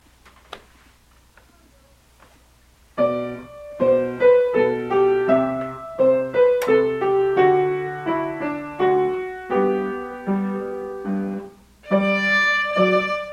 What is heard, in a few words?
Minuet 1 tune, Strings Practice Recordings; Level 1B